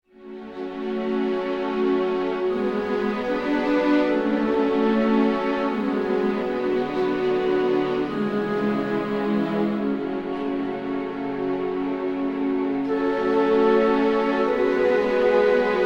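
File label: Ambient Requiem Adagio; Shadowgrounds; windows gamerip 2006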